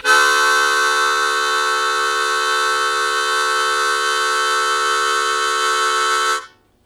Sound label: Blues Chord Attack D1, Harmonica; Zero G - Harmonica